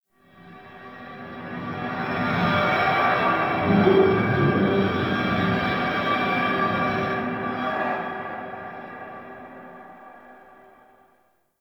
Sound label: Metallic Drone; Warframe; Ambience; Nineteen Ninety Nine